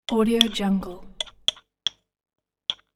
kitchen knife in the jam jar

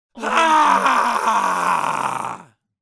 male epic scream

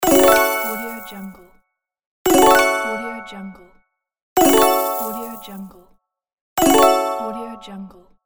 application achievement

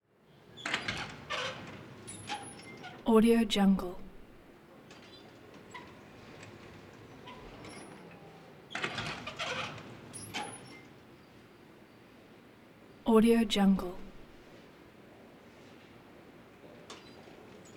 elevator from lobby perspective; prosoundfx

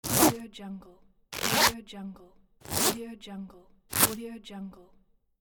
zipper

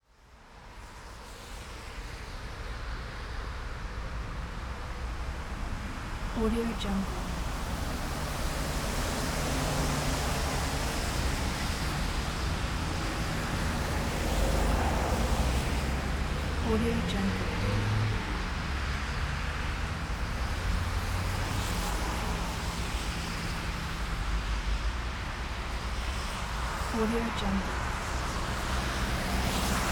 wet busy street ambience

